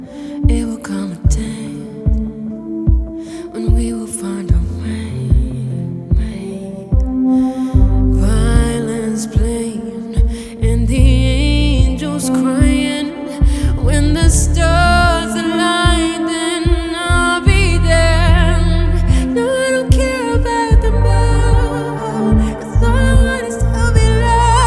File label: Acoustic